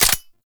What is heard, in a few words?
Pistol Dry Fire; Weapons; Warframe; Tenno; Lasrian